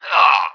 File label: normal dying; Emperor - Battle for Dune